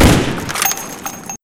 pistol

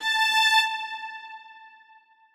Strings kiai

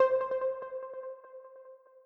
EPiano

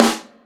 snare; osu

